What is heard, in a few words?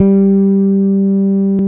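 Bass Electric Full G3; CMI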